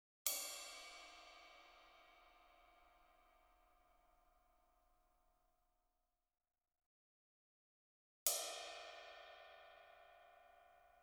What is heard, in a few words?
ride 1 + 2 stick; Sound Sampling Collection VOL-5; Drums & Bass Fusion Funk Effect